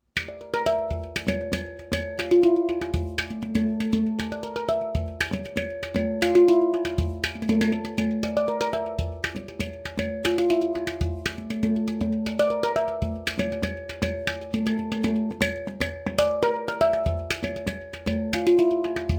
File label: Hang Drum